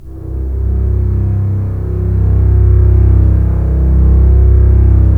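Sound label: STR CBS, Strings; Roland - LA Composer vol. 4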